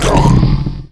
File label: gurp; openffa